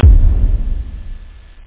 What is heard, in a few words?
HARD RUM, DRM PERC